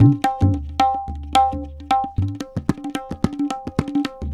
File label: tabla funk 110